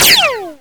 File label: shoot